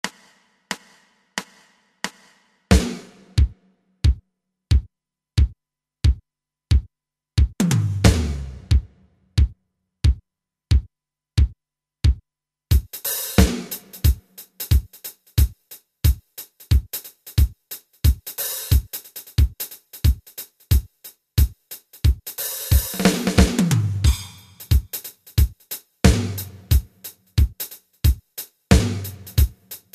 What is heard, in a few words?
Pop Shuffle